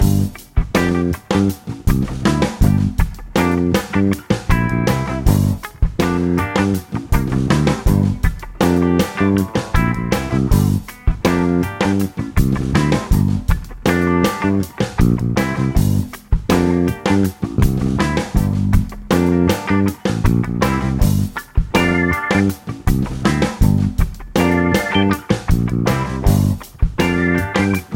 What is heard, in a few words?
solo loop